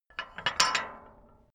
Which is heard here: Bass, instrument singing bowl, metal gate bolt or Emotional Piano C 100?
metal gate bolt